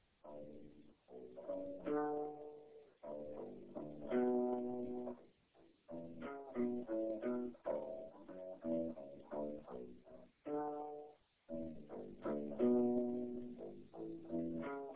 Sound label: singing bassline